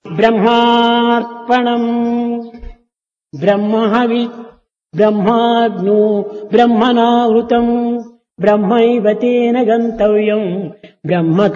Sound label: food prayer; songs; bramharpanam